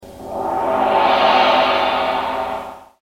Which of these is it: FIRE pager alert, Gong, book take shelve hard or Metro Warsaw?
Gong